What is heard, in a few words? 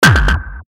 Hit Low Fuzz Delay; Bass Button; Production Element Imaging Element Accent Transition; Blastwave